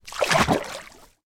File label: water splash or movement